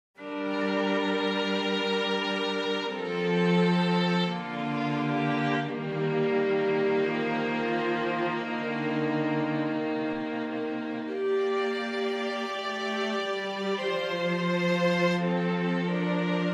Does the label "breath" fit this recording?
No